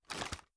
ar inspect, weapons; viper

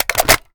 ar reload empty mag in, weapons; viper

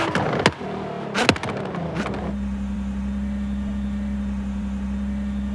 throttle off, vehicles; nismor35

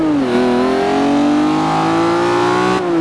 second, vehicles; bmwm3gtr

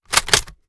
kilo98 reload scope start bolt open; gmod; viper

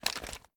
reload xmag end; gmod

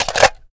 ar mag out, weapons; gmod; viper

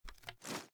reload fast swing; gmod; wfoly plr sh charlie725

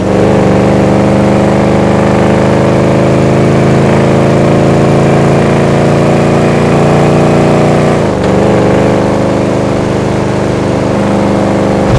fourth cruise, vehicles; gmod; tdmcars; gtav; gauntlet

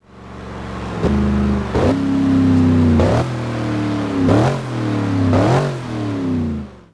throttle off, vehicles; dod ram 1500

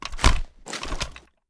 gun butt hit; gmod

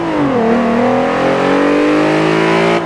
second, vehicles; gmod; tdmcars; golf r32

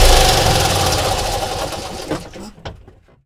v8 stop; commoncar

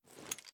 charge reload start; gmod

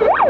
elss faster

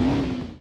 stop, vehicles; ambulance

